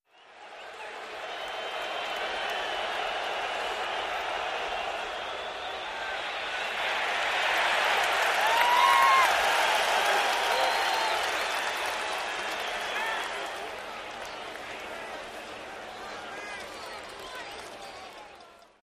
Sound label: Football Crowd Idle, sports arena football